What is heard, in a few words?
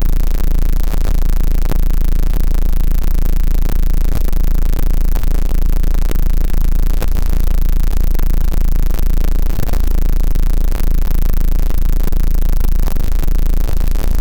the sound of som, mouth